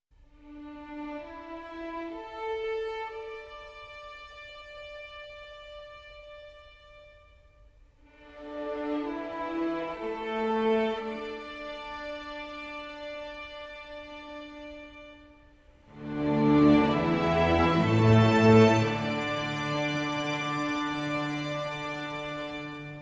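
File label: For Strings, Percussion, Harp and Piano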